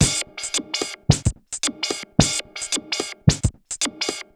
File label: Juno Filtered Korg MR-16 (110BPM), beats